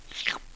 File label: slurp